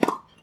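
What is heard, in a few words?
tennis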